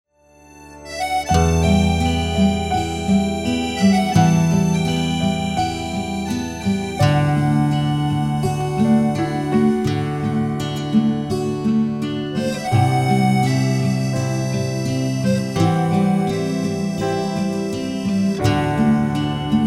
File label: instrumental